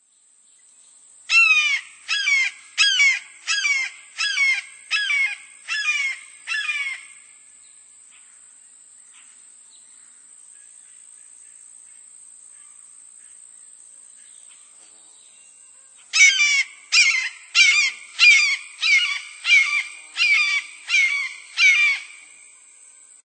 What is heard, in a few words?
Red-shouldered Hawk; Birds